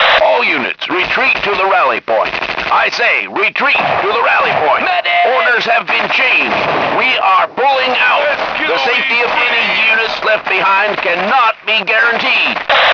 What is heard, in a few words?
city; svencoop